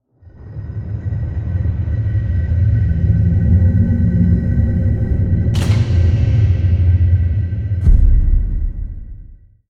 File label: elevator up; ice age scrats nutty adventure